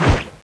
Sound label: dragonsword slash; cstrike; bb weapons